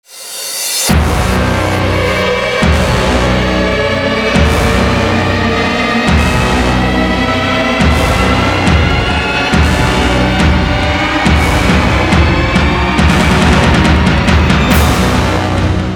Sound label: Creepy horror scary background trailer music; Mantra Studio